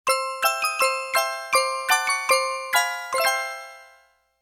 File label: office, notifications; ZTE Grand-S-2